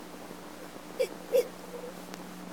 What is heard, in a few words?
Inia geoffrensis; Silver Springs, Fla. 3-Oct-1956